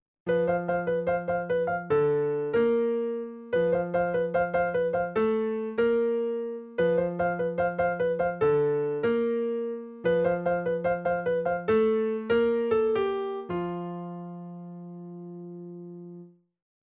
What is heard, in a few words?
Guitar line